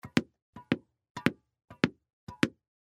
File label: tennis